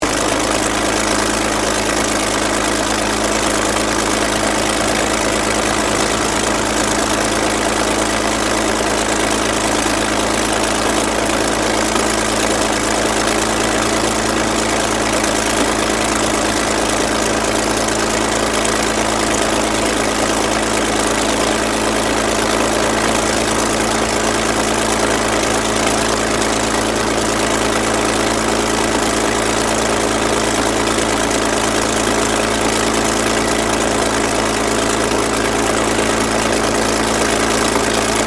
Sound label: AIRCRAFT SUPERMARINE SPITFIRE MK-XVI IDLE; Airplanes